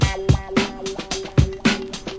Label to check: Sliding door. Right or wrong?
Wrong